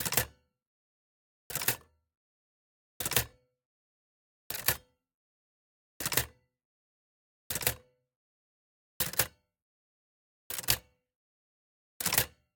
Typewriter Olivetti Typebars mechanism fast speed, Olivetti Linea 98; Dramatic Cat